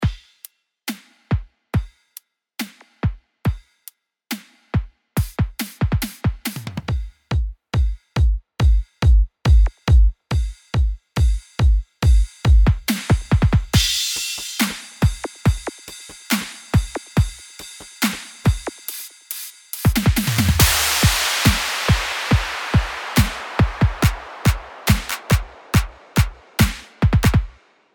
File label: Drummer